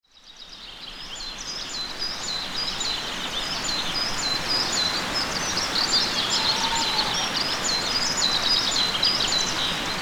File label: forest